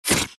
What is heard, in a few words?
Ink Hurt